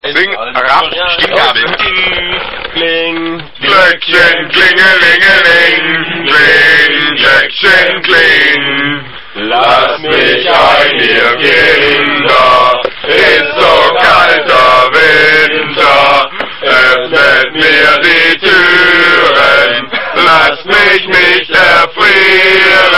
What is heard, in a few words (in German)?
kling